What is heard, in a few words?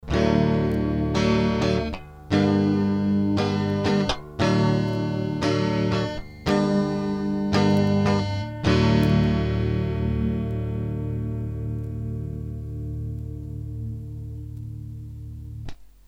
Gtr Chorus; Antilope Zen Tour